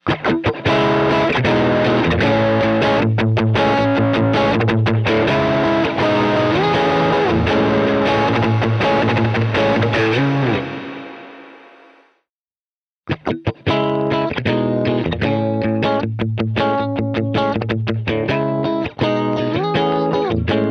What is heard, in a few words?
Guitar; Green Tides; H90